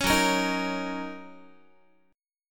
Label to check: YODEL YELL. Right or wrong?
Wrong